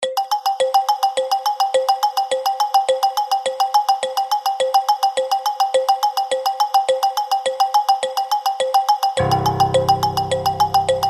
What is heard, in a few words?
210 BPM